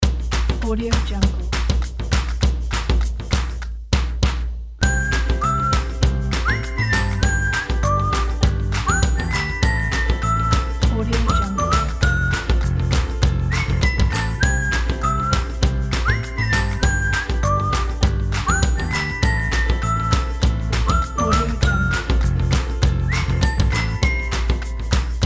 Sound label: upbeat; worldmusic